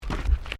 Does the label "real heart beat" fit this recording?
No